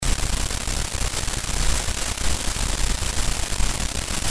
mikro, Rauschen des Mikros

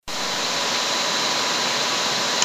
rauschen des mikrophons

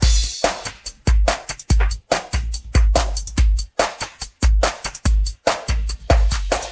snare schnipsel drums

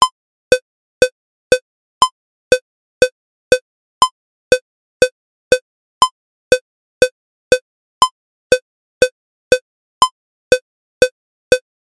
fetter beat

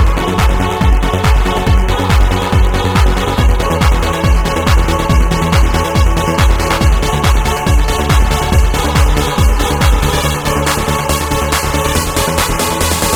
Marsch

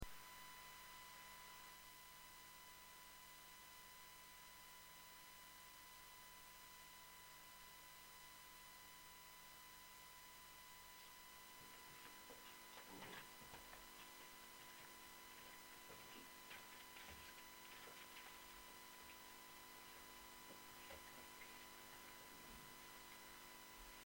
rauschen

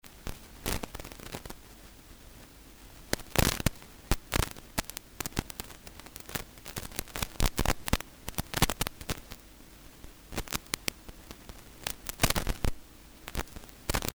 rauschschschsch

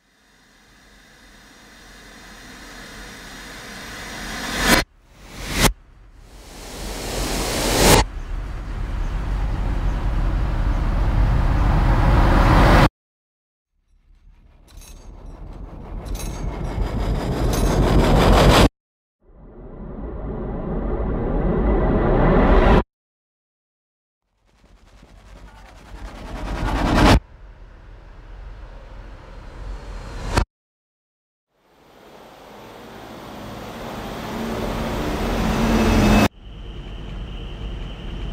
Sauglochsounds

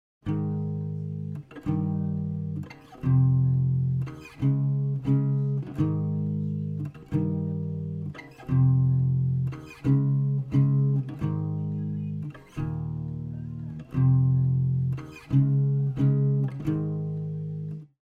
air, Piano & Flöte im leeren Raum

